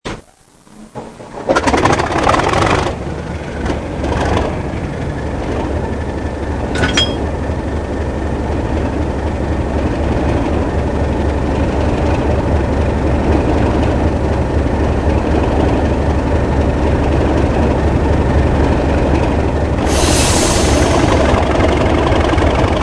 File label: BR Class 37 sequence